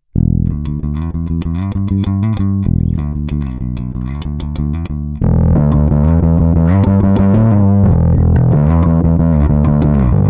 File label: Scrambler